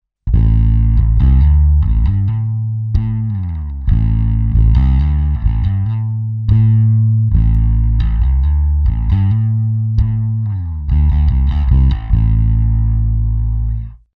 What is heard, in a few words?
hi band, verzerrer; ak-workshops